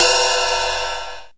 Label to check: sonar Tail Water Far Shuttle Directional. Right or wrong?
Wrong